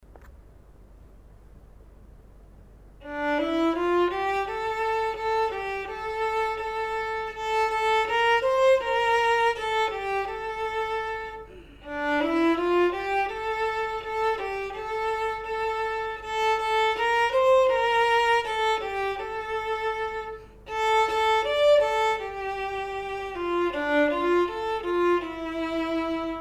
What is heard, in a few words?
perf vln; Building Technique with Beautiful Music, Book 1 Violin